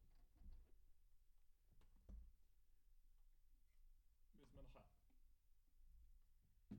Room A

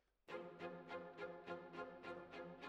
Violins Over

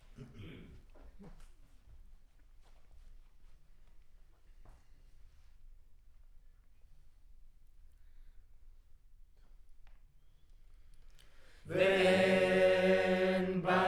Center u47, Choir

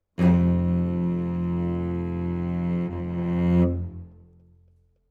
Cello Solo

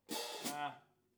Ride

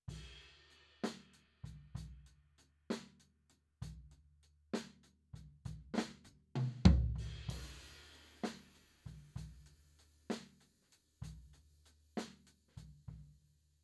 Floor

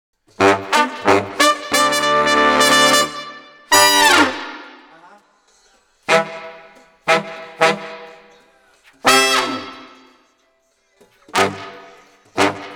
Brass

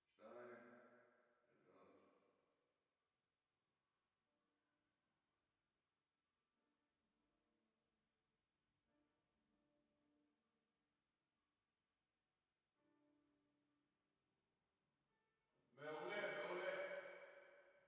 Drums Verb

